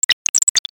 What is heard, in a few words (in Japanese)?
okane